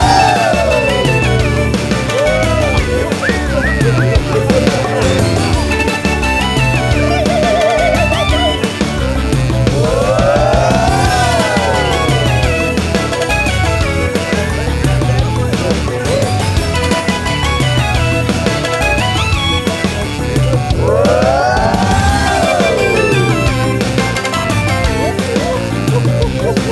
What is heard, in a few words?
Bonus stage Loop; Jitsu Squad; ps4 ps5 switch windows xbox one xbox series xs